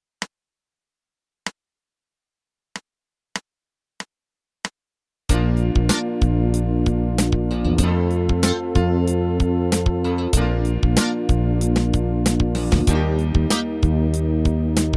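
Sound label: rhythm patterns Bb instruments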